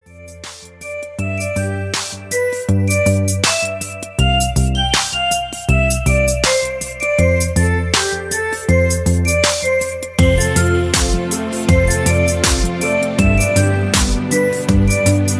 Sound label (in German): musik hoerprobe